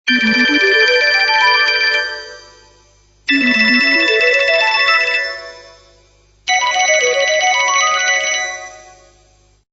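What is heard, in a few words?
musical, sony ericsson phone sounds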